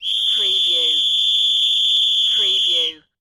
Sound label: Whistle Blow Long; Sound Effects Bible